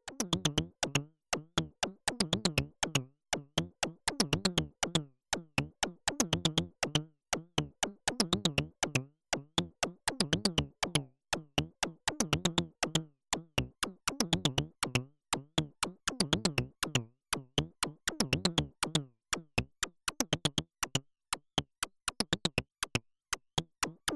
dreadbox Erebus V3 Percussive Triple Ring

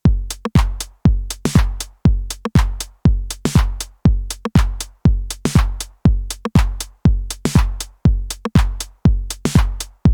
AMS dmx 15-80S 909 dry

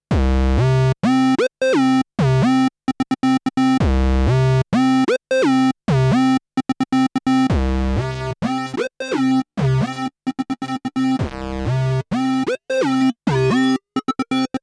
Korg EA 1 Chorus

